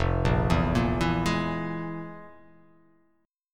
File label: F Minor Major 7th Double Flat 5th, chord; down; chord shape